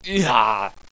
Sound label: player pain